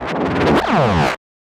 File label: stylized glitch sound; soundscrate